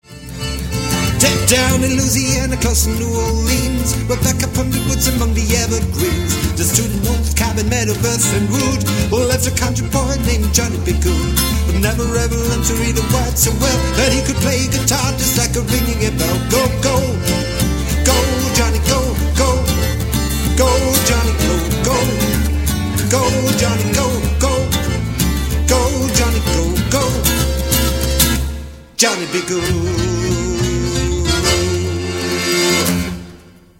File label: rock